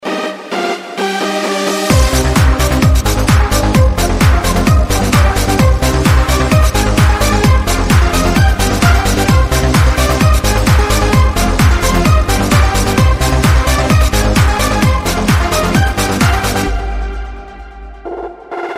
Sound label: polka